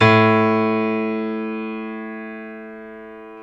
PNO Grand Pno; Club-50 - Foundations Roland

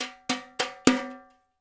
BRUSH TIMB; Spectrasonics; Liquid Grooves 1 & 2

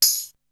Tambourine; ZERO G - Datafile DISC 2

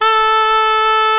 gralla A4; SoundBytes - Early Patches

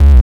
hard bass drumz

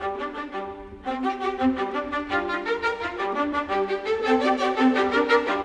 Strings; Sonic Foundry (Sony Creative Software); Orchestral VOL-2; Modern 09

